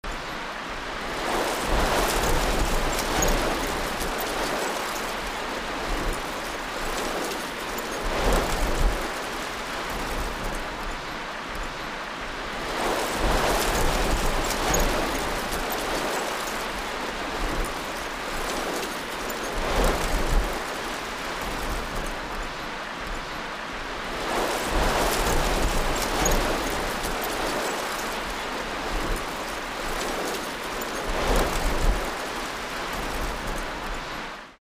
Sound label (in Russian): zvuk peschanoj buri